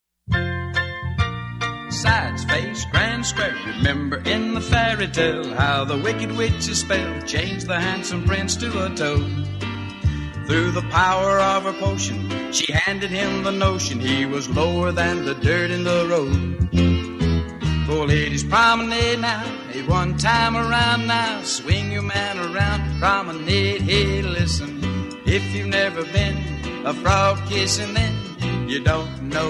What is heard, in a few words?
frog kissing vocal